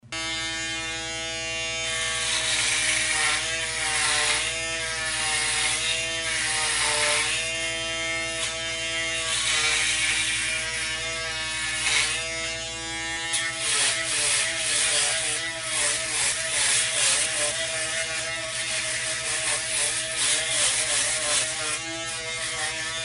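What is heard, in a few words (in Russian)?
триммером бреют голову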